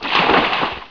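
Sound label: out water; tnpdemo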